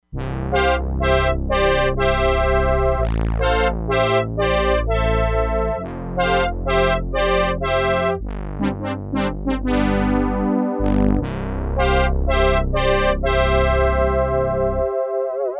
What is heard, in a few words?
BRASS, Crumar Bit 99; Factory Programs